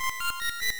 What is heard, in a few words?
Ocarina Frog Song Revive; Zelda